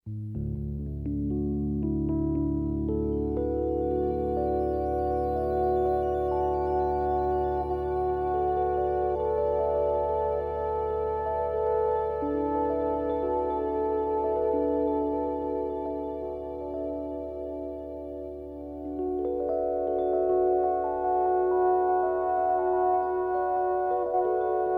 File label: music babies